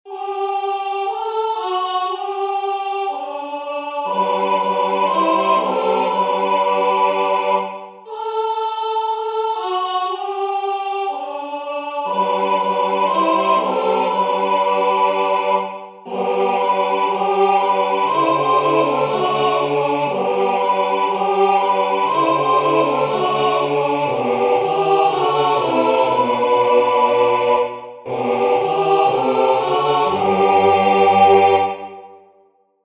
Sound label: tutti; NoËl Polonais